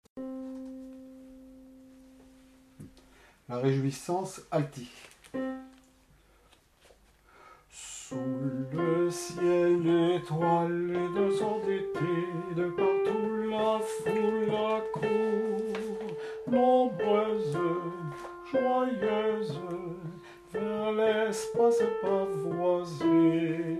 la rejouissance alti

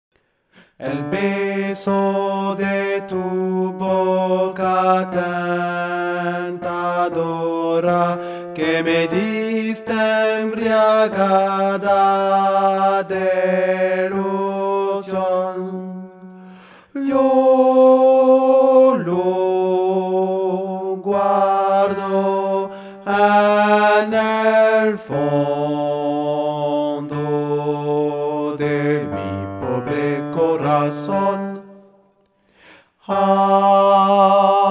Tenor Basse; Dulce embeleso

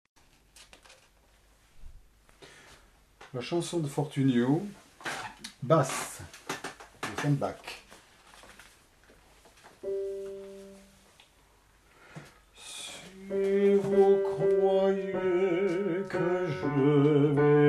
chanson de fortunio basses

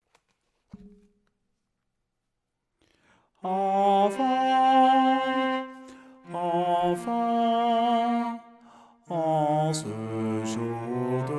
Noel des enfants du monde baryton

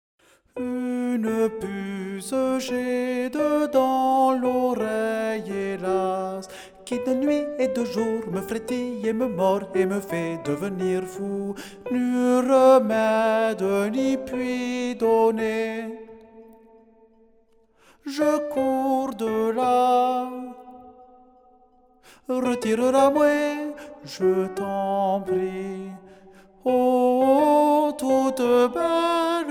UNE PUCE SOPRANO

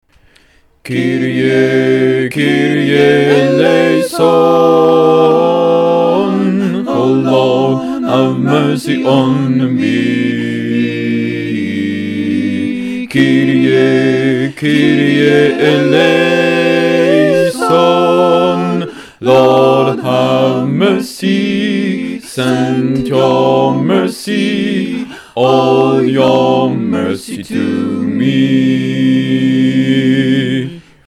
Kyrie Basses

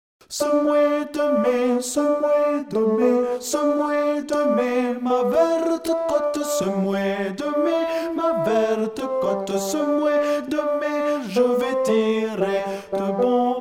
Ce moy tenor

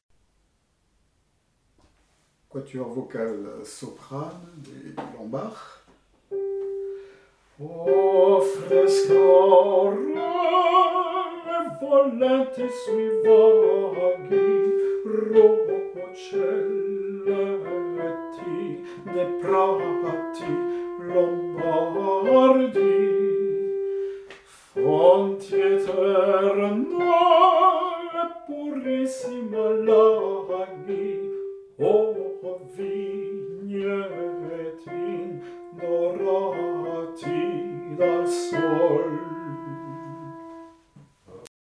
lombard soprani

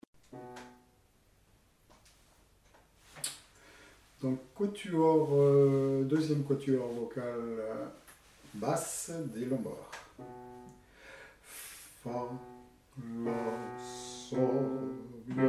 lombard basses

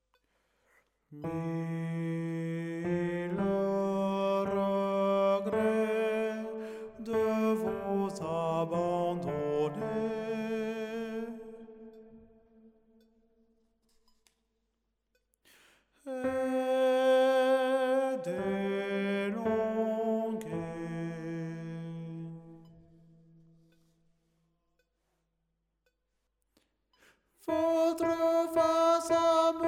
MILLE REGRETS TENOR

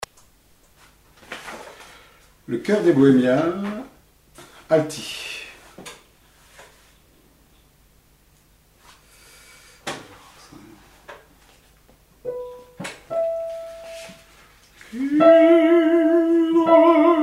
le choeur des bohemiens alti

